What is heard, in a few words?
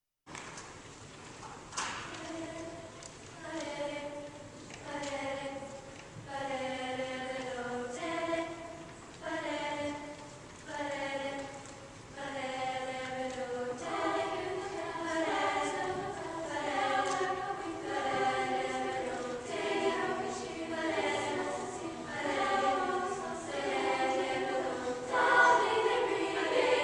chamber choir; carol of the bells